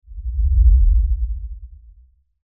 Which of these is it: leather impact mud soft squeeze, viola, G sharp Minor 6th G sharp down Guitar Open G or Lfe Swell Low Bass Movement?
Lfe Swell Low Bass Movement